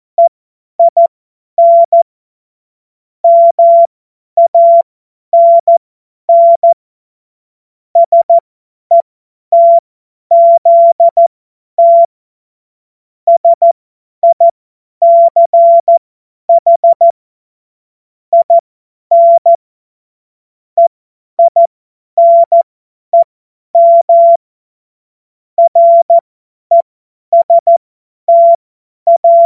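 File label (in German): Witz, Morsekurs, cw morsen; Witze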